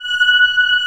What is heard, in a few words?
BEAUTY VOICE; AKAI S6000 CD-ROM - Volume 1; VOCAL ORGAN; B VOICE & BELL